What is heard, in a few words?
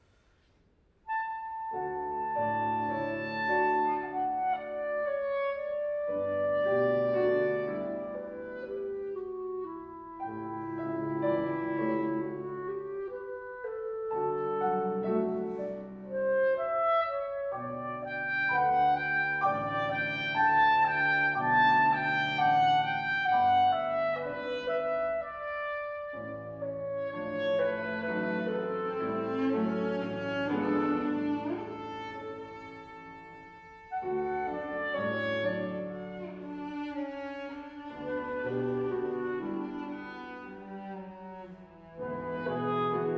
Trio for Clarinet, Cello and Piano Adagio